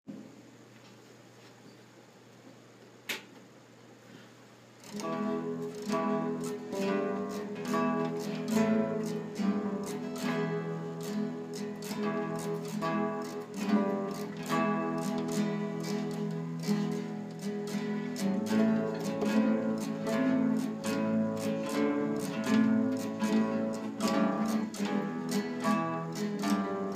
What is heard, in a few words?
bass rock